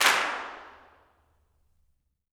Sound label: CLAPS, Gospel